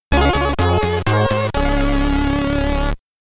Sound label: Level Completed; Black It; commodore 64